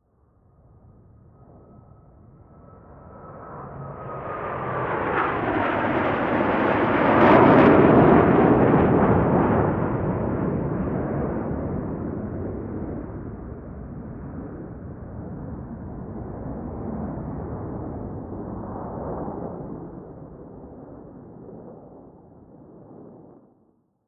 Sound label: air strike